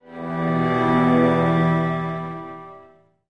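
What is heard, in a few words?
Music Transition Cello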